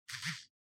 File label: Cell Phone Vibration Hand